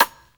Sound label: SD, dance drums hip